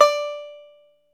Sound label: ARCHTOP, Arch Top Banjo